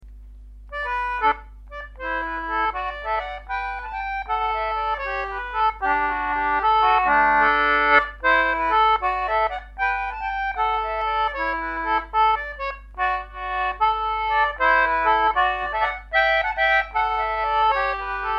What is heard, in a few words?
Concertina; Katies Rambles; harmony